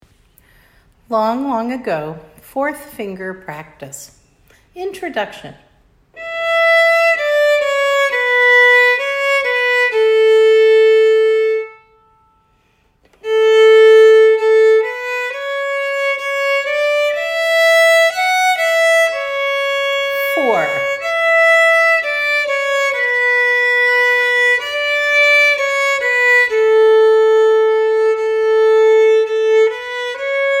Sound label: Long Long Ago 4th finger practice